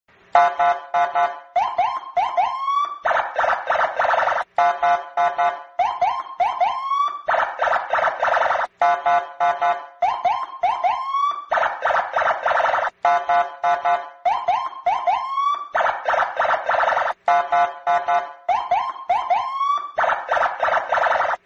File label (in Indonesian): suara klakson mobil polisi suara klakson police siren sound